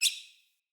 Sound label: Messenger Rat Squeak Single B; Ambient; Vocals; Verminia; Animals